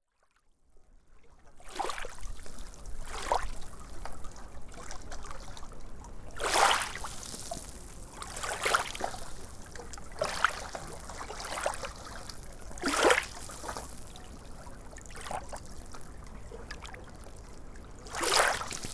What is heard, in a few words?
soundscape